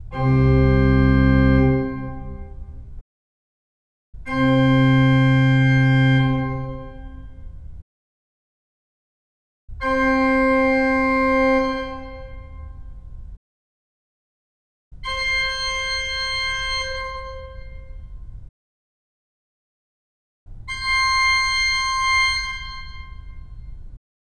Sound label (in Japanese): Organ 手鍵盤 C; 気鳴楽器; 手鍵盤C2～C6